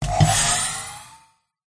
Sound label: fuzzy power up